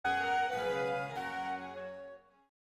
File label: musette